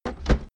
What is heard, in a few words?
Tank Reload